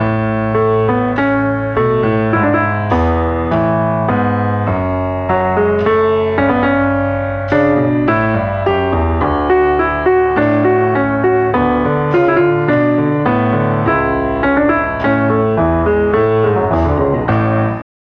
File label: solo slow